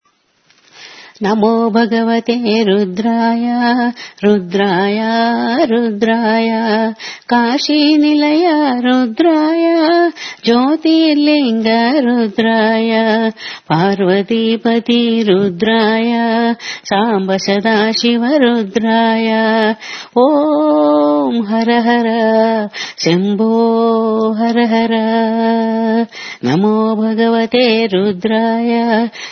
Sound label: Bhajan; Namo Bhagavathe